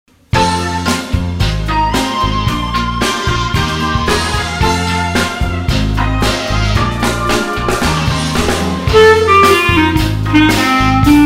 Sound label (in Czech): klarinet; arnost gunar